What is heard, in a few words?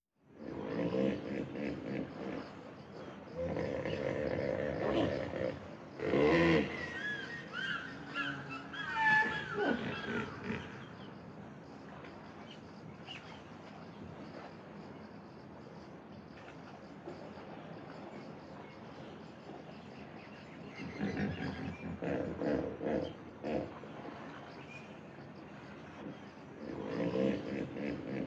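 hippopotamus voice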